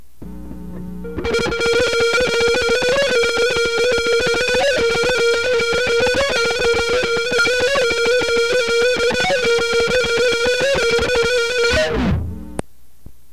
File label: guitar two